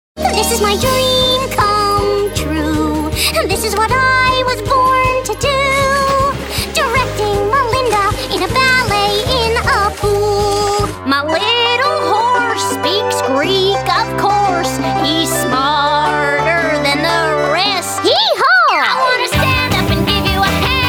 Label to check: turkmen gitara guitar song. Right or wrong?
Wrong